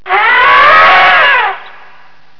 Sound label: ELEPHANT